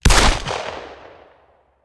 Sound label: Hand Gun; Sound Ideas; Guns